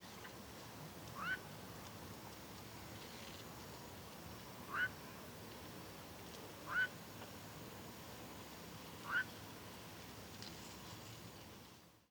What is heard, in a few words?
1st call